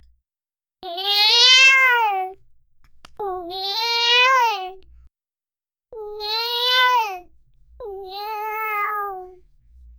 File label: a sweet 3 year old child, playful